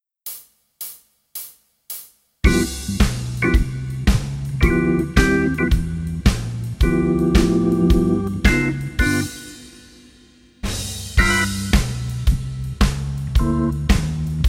guitar play along, blues, jazz

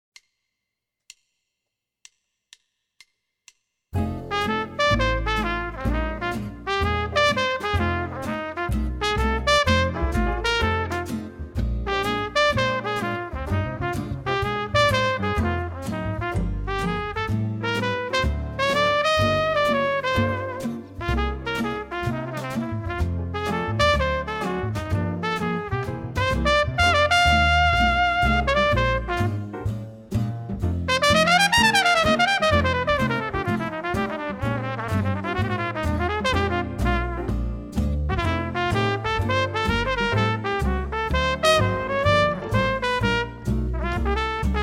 Jazz F Blues; LMEA All-State Jazz

